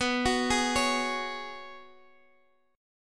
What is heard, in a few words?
C sharp 7th Suspended 4th B; C#7sus4/B; arpeggiated